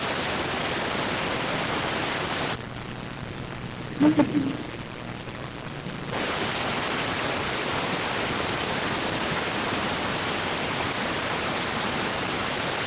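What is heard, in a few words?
radioteletype receive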